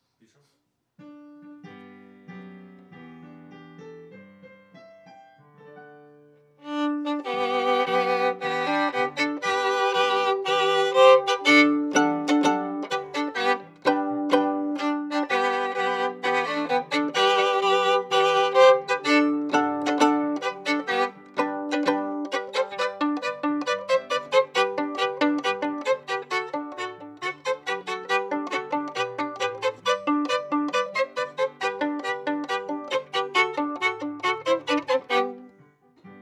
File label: Viola D